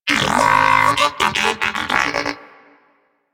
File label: Enemy Killed, Moa Pet Tenacious; Warframe; Pets